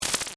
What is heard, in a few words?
Device Digital Page, Turn, Readout, Scifi; Science, Fiction; Tech Sound Effects